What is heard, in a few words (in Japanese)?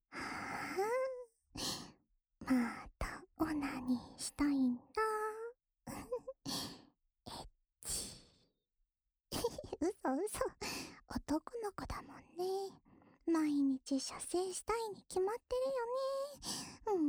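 耳舐めループ（右耳）, ASMR; オナサポ用; リリア; 特典 恋愛サポーター・青春べろちゅー委員会♪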